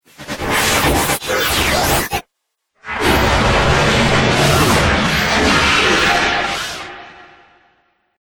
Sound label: Dubstep Scifi Effects